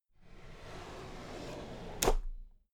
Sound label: drawer cabinet equipment open soft